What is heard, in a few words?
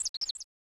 Birds; Waialae Country Club; SNES